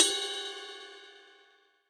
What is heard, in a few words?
bell, virtual drum sounds